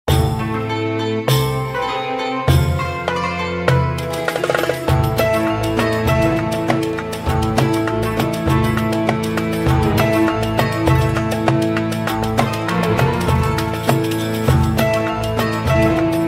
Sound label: Desert; SMM2; SMW